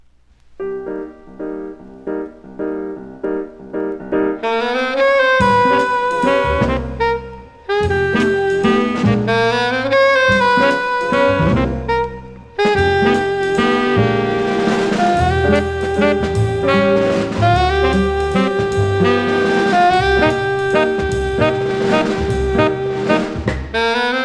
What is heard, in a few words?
fire waltz